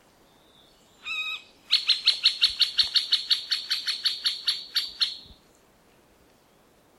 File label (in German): mittelspecht